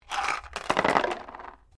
dice medium cardboard box throw

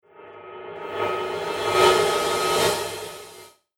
Reverse Cymbal Tremolo; Cymbal Sound Effects

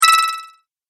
Classic Spell, magic sound effects

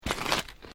Footsteps Boots Volcanic Rock Step; Footstep Foley; Human

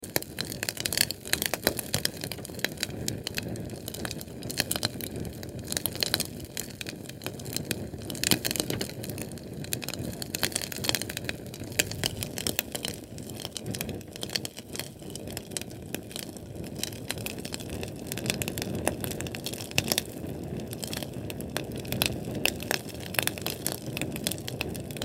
Sterno Flame Crackle Catering Food Tray Loop; Sterno Flame Crackle Catering Food Tray Close Perspective Large Loop

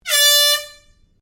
Airhorn Large Blast Short, Compressed air horn; Sports

